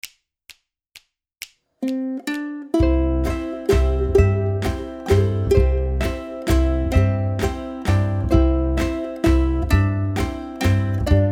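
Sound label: OH SUSANNA BACKING, ukulele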